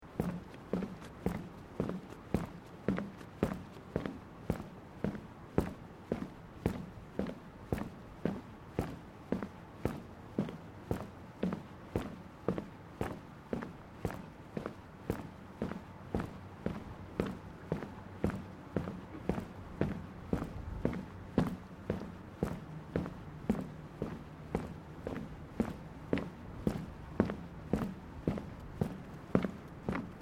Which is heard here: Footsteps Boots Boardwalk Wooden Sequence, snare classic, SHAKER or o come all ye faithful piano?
Footsteps Boots Boardwalk Wooden Sequence